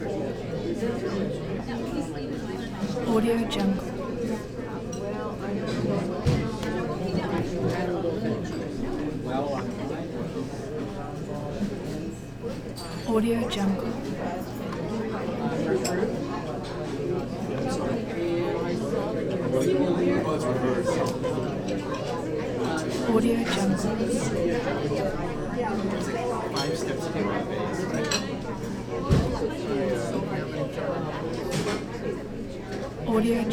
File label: cafe crowd walla breakfast weekend; prosoundfx